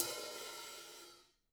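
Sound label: hats open, Drum Kits; Sonic Reality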